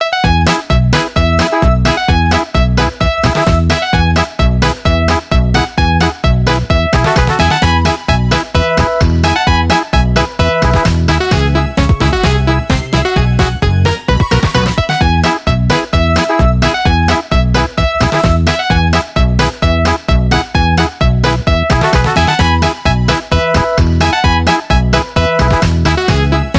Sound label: Gameplay Comical; Everybody 1-2-Switch!